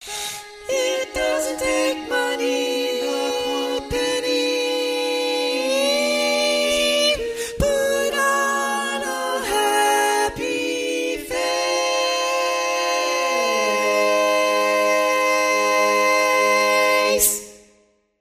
Tenor, barbershop tags

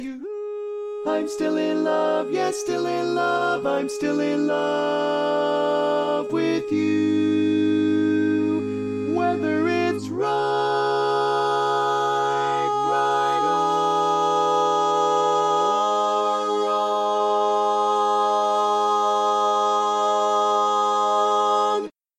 Lead, barbershop tags

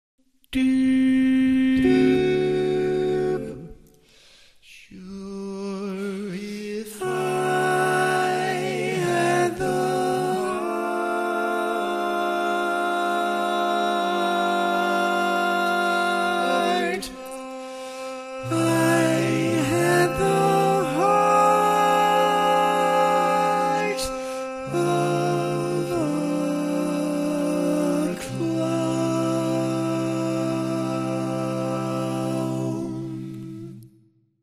Tenor, barbershop; Slow, Chord Worshipping version

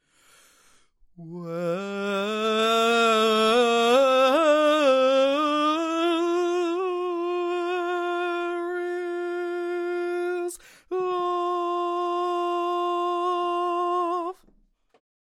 Lead

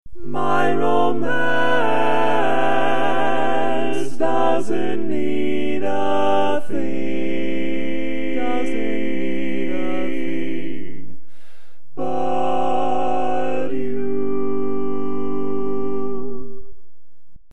All Parts, Barbershop Tags

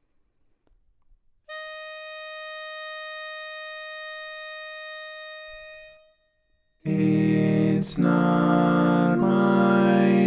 barbershop tags, lead

